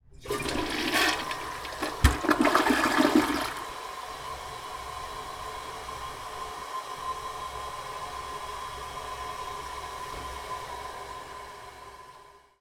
Toilet Flush American Distant Lid Up; InspectorJ

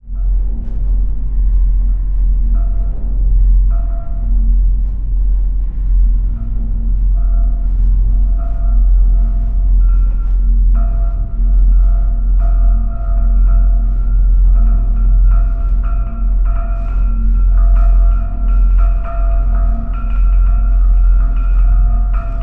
Dark Movies Ghostly Ambiences; Bluezone; Dark Movies Ghostly Ambiences and Sound Effects

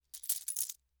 Money, Coins, Handle; Hzandbits

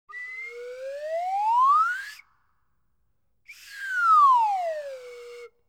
Swannee Whistle, Ascend And Desce; BBC Sound Effects Library

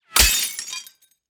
Bullet Impact Glass; Bullet Bys & Impacts

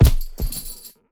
Punching Percussion, Bag Drop, raw; 99Sounds

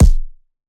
Punching Percussion, Straight Hand, processed; 99Sounds

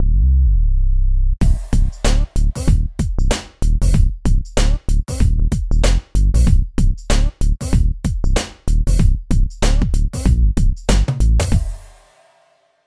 Signature Sound; FXHome; Signature Tracks

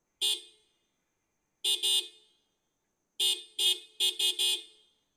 Motorcycle - Indian - 4 Stroke - Horn; TVS Apache; The AudioVille; Motorcycles of India

